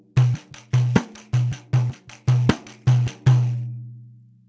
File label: Caixa, pandeiro